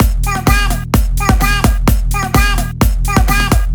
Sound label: Mainroom Kit; Streamer